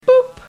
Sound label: boop